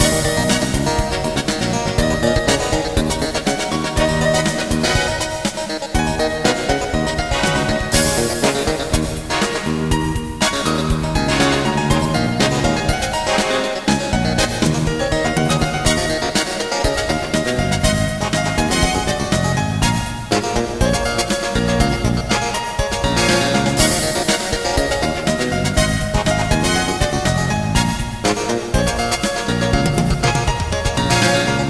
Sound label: BAR MUSIC; Duke Nukem; Caribbean vacation